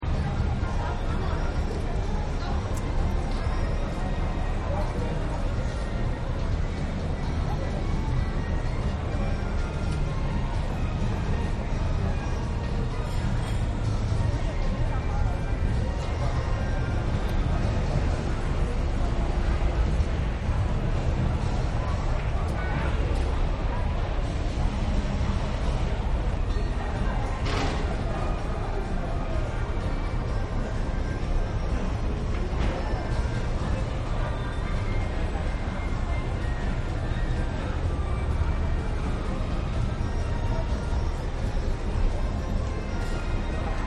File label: Traffic Voices Music India; Traffic And Cars; Partners In Rhyme